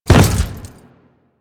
Step Default, Footsteps; Warframe; Grineer

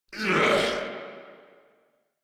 Death, Grineer Male

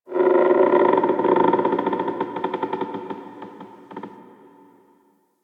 Wood Creak; Warframe; Quests; The New War; Ambience; Zariman

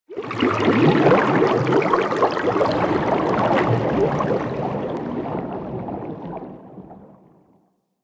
Sharkwing Movement Bubble, Vehicles; Warframe

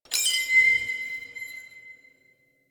Shard Upgrade Pressed; Warframe; Helminth Shards

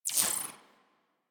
Briefcase Launcher Aim Enter; Warframe; Weapons; Corpus

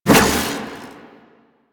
Staff Swing; Warframe; Duviri; Dax; weapons

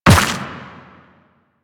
Run Dirt, Footsteps; Warframe; Quests; The New War; Kahl

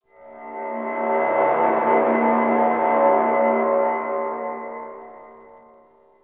Synth; Warframe; Clan Dojo; Dojo Randoms

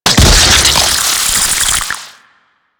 Fluid Sac Throw Impact; Ambience; Warframe; Infested Eris; Encounters

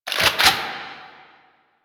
UI Menu Insert Coin; Warframe; Caliber Chicks minigame

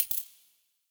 Ur Coin Drop; UI; Minigames

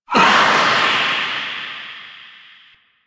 Hand Stunblast Back; Duviri

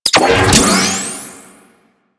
Bomb Fully Charged; Missions; Raid; Grn Bomb Objective

